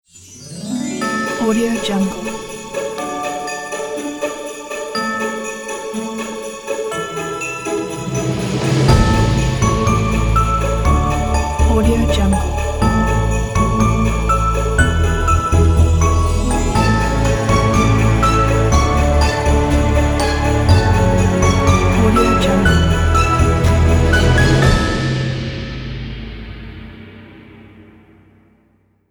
christmas ident logo

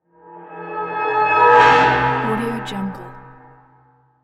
mystical transition

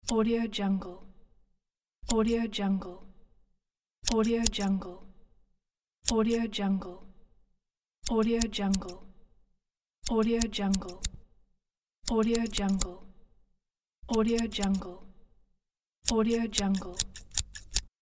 scissors; watermelodyon

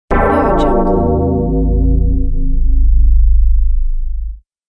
psycho bell dark ding

